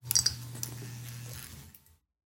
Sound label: light flicker, ambient lights